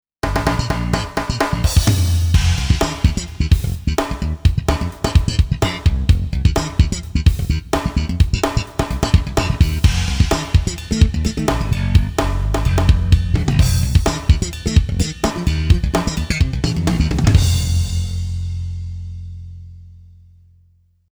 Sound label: bass and drums; xp-80; roland; Multi-patch demo; roland demo cd-3